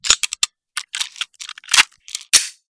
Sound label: tt33 load, weapon; metrocraft